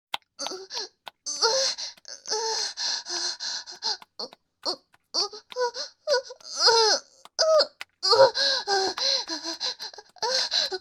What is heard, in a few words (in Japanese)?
オホ声; SEあり